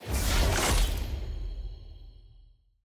reveal permanent rarity none; loot

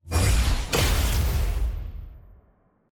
sfx opponent found; clash

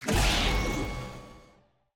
skill death; shaco

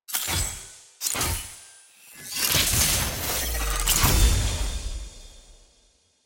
loot hextech egg open epic; lol

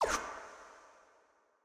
ui generic exit click

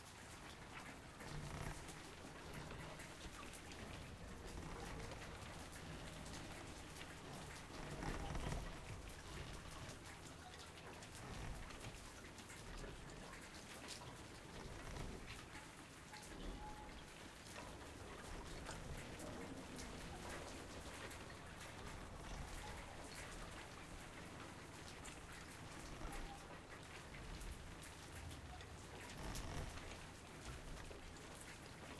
amb bilgewater outside

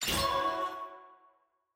ui main watch click

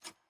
sfx ui generic section microfiche hover

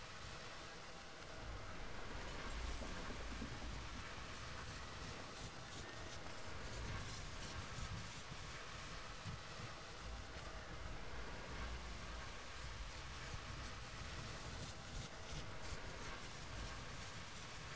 amb smoke bomb gray; piltover

